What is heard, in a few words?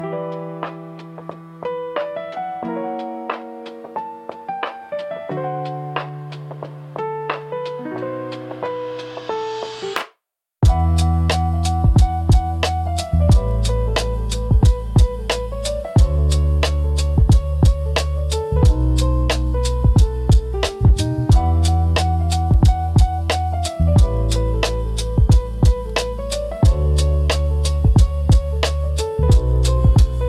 mysterious lo fi intro piano 120bpm; for youtube